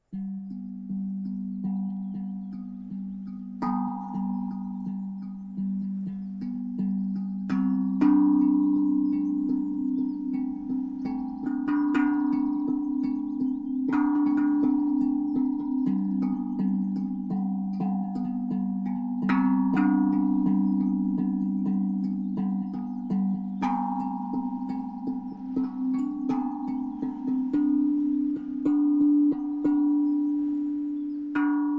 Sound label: handpan duet